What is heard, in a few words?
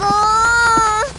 Moan